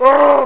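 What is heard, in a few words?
player pain; Abuse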